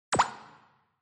Onoma Button Click (Reverb)

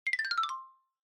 Xylophone Slow Short 4 Down

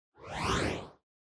Camera Motion Mid Effect; カメラモーション06-4(中 エフェクト); シーン・カメラモーション

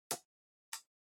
PC Keyboard Far Shift

